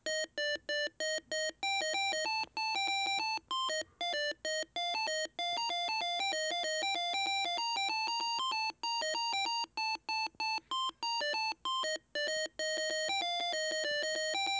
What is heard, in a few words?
Arduino Jazz Player